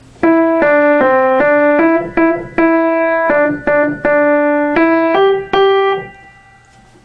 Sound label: piano